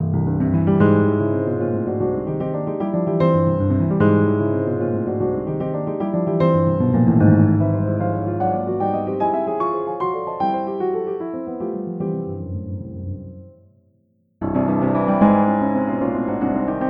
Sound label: Rachmaninoff Uprights